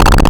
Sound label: snr; Mono